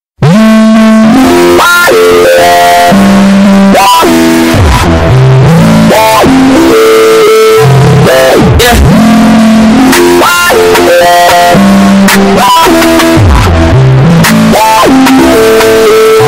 earrape

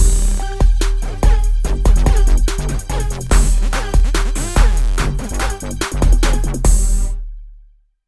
Twerk; iphone 8

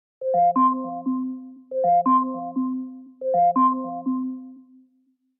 simple alarm; Alexa

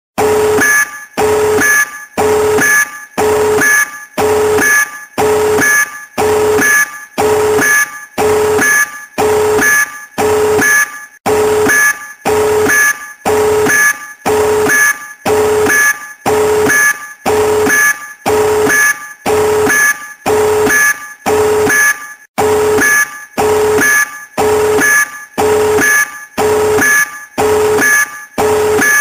Tactical Nuke Alert

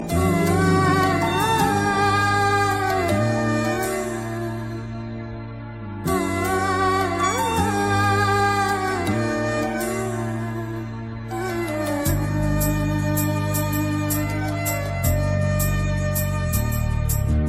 Nice Tune

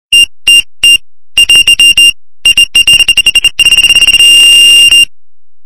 Fox Alarm Slow Run

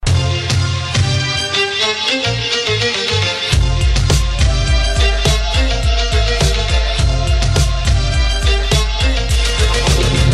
Arabic

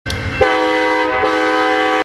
Amtrak Horn

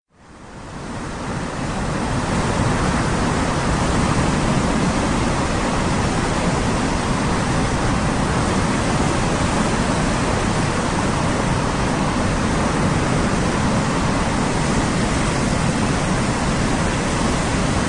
Waterfall

